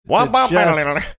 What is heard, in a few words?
singing few notes